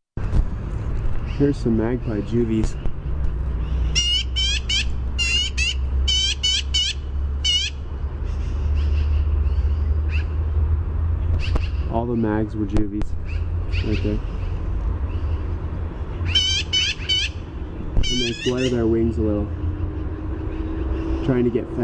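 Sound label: chatter clear, Black-billed Magpie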